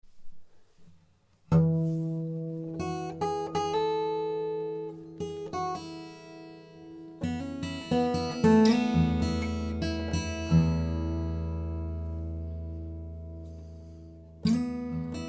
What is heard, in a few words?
fingerpick